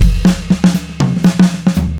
Loops mutantes

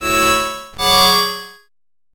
disminuida sample bandoneon fast

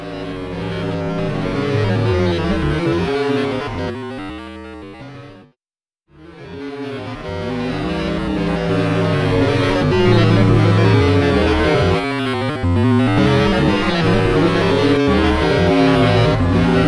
loop procesado freeze freq Shift

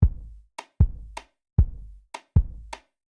Ritmo Bombo; Kaani

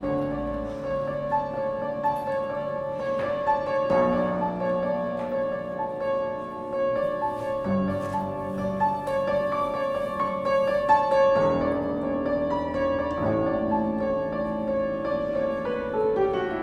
Loops mutantes piano cama

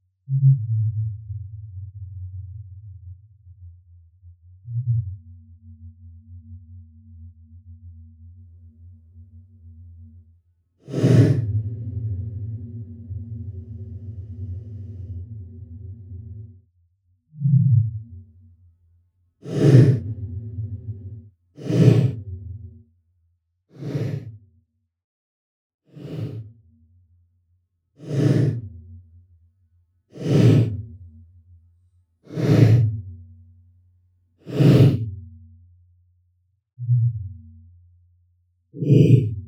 Loops mutantes

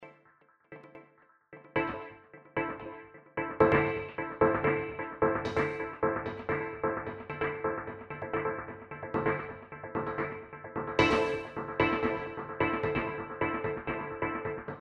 Bateria Ping Pong Groove; Court Society

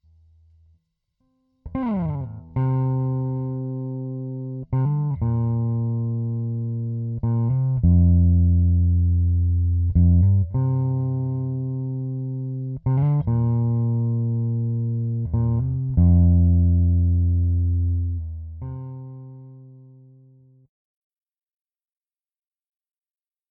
bajo

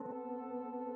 Freeze Colchones

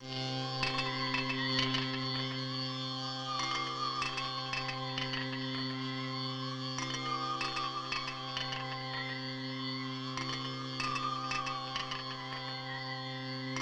Loops mutantes

